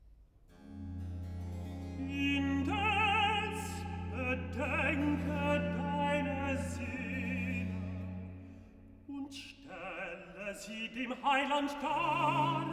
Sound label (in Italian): Cantata, Recitativo; BWV 114 'Ach, lieben Christen, seid getrost'; VI. Recitativo 'Indes bedenke deine Seele; Bach; Lund; 17th Sun after Trinity